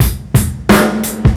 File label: Pharty phunk 88bpm, Hip-Hop Street Beats; Sonic Foundry; Hip-Hop Street Beats Construction Kit; Street Beats by Poogie Bell